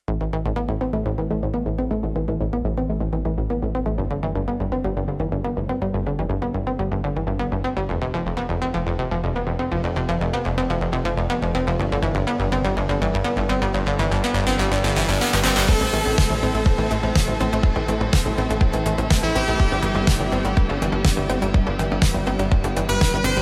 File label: Electronic Impulse